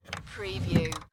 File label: Dresser Drawer Open; Sound Effects Bible